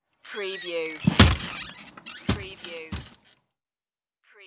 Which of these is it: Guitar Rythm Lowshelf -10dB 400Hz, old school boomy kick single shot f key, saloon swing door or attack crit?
saloon swing door